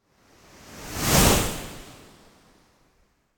Wave By Medium, Fast And Thin Wave - Passing L to R Wave Whoosh; Hollywood Edge; sound designer's toolkit v2